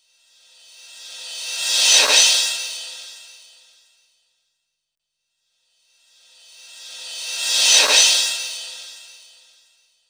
CRASH; CMP - Analogon

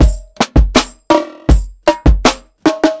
PICCOLO 80 BONGO, drums; Basement drums vol 2

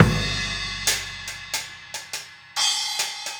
Cymbal and Kick

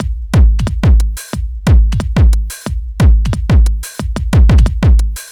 Sync Beat, Drum & Bass, Beats & Breaks; Fluid Dynamics

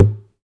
Plastic Box, household rhythm samples Hits

